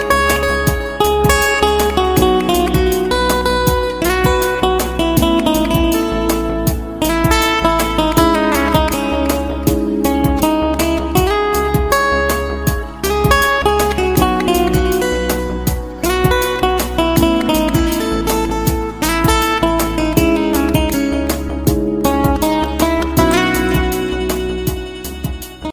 bollywood guiter music ringtone

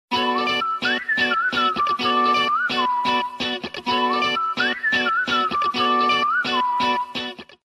whisle sms ringtone, apple sms ringtones

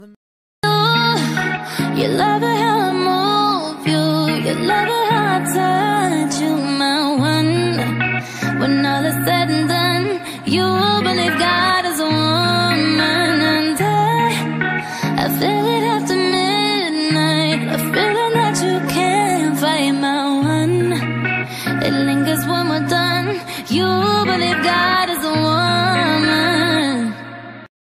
english mix ringtone

